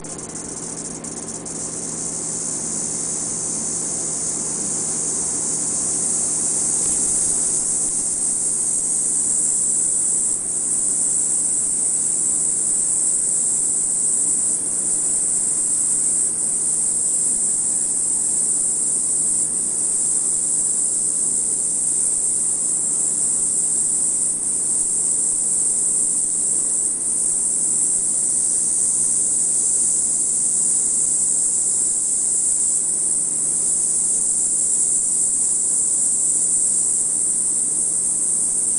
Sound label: chorus, cicadas; Diceroprocta; D olympusa